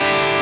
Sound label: Dsus4